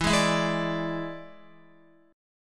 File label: E 7th E down Guitar; E7 strummed; JGuitar